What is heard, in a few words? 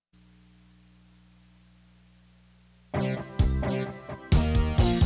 rock